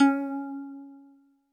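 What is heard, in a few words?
Jazz Harp C#4; Kawaii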